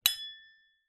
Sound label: impacts metal hit chime scraper